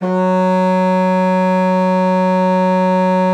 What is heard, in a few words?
F#3, bassoon; Xsample VOL-6